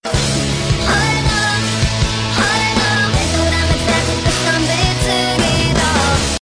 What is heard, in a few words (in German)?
heul